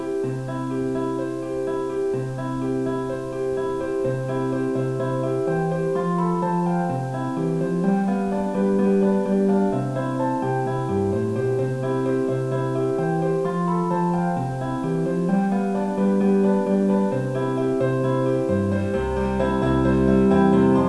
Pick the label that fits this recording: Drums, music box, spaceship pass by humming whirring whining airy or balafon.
music box